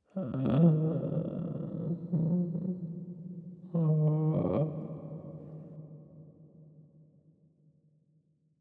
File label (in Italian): lamento uomo; Inquisitor 3